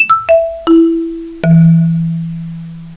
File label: Marimba; DeluxeMusic2